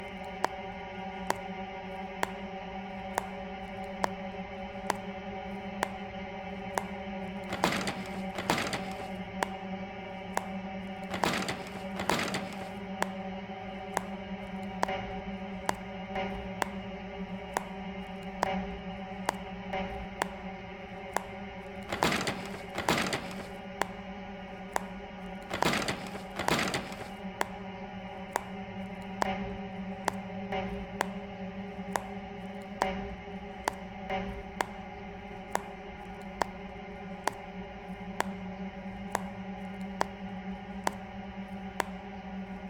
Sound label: Soundscapes